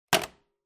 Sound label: Telephone Hardware Receiver Hang Up